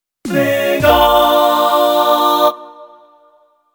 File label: soundlogo, 8bit